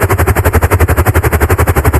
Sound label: helicopter mono loop; Transport